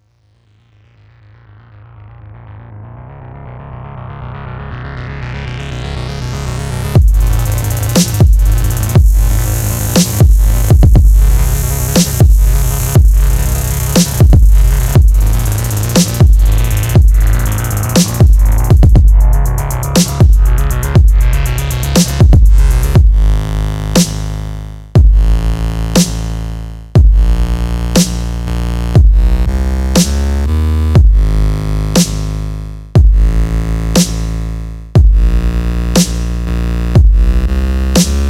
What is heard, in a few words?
Grains (Instrumental)